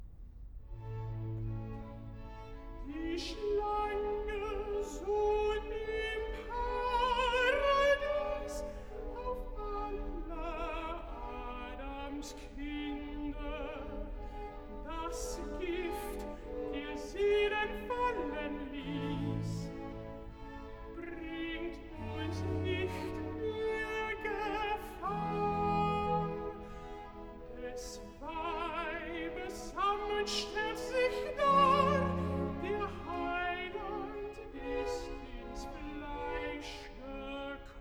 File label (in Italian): Recitativo (Alt); Cantata, BWV 40 'Dazu ist erschienen der Sohn Gottes'; V. Recitativo (Alt) 'Die Schlange, so im; Bach; New York; Xmas Day + 2nd Day of Xmas